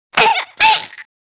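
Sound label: electrocution vo; foley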